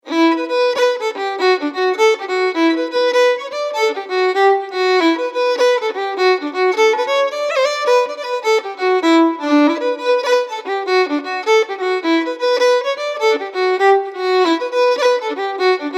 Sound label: Castle Jig